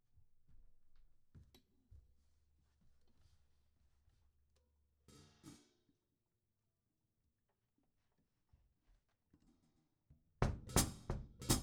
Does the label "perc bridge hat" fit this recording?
Yes